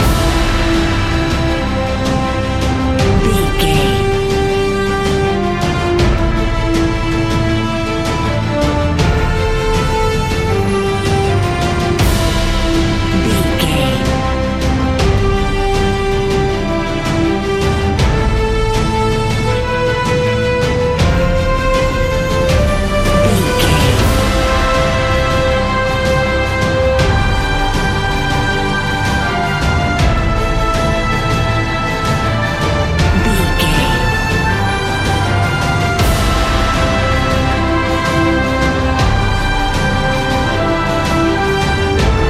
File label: cinematic corporate loop